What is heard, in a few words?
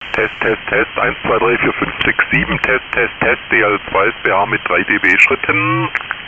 3dB Steps